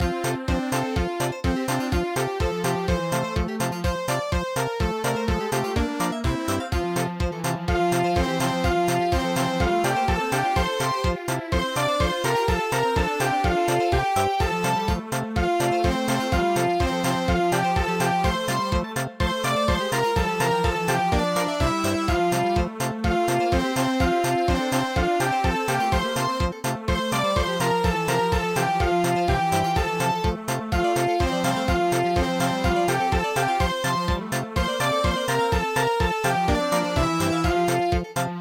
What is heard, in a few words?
Toy March, Light Pops